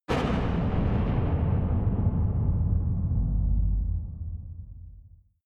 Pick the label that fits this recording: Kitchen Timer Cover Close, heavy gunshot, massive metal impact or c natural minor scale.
massive metal impact